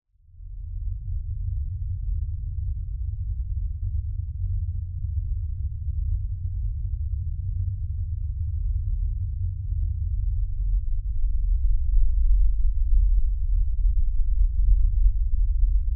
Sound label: Impact Lfe Rumble; Swell; Low Impacts; Sound Effects:Impacts; Blastwave